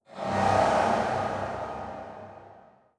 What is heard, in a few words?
target acquired wet, strider; creatures